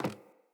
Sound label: Sandals Run Wood, Footsteps; Player